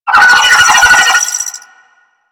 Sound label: Space Drone Attack B; Warframe; Corpus Space Drone